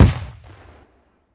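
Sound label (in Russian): глухой негромкий выстрел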